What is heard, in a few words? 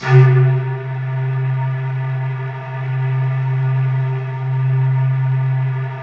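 C2, Roland JV 2080, analog sequence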